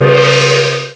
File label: a gong; Amiga Soundtracker Sample Packs